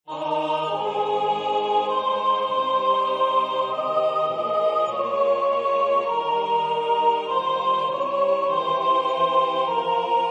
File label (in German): Hymn - sopran